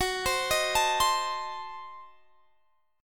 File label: Gb Diminished 7th, down, Guitar, Standard; arpeggiated; capo 2